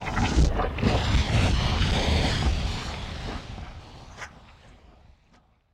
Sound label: lava burst